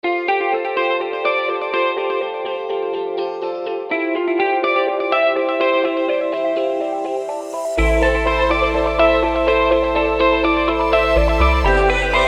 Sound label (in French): Accompagnement